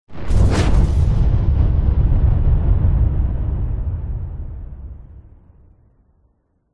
warfare missile bomb incoming whoosh into large explosion